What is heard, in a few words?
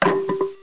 staff fall; DR BRAIN; BUGS